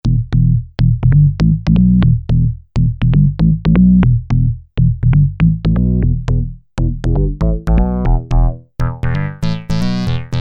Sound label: organ bass